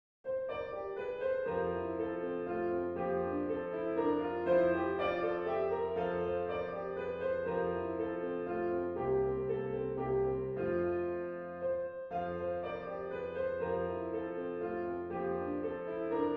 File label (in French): valse fa majeur; beethoven ludwig van